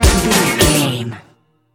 club anthem sting, Dance, EDM, Club, Energetic; Exercise, Aerobic, Aerobics, Spinning, Workout, Disco, Eurodance, Confident

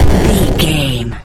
retro explosion deep

